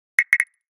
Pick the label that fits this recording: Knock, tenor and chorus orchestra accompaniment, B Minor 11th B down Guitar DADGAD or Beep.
Knock